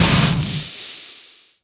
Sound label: beam target end